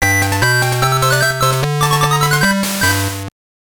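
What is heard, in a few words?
Jingle, Super Game Boy; Soreike! Anpanman Fushigi na Nikoniko Album; GBC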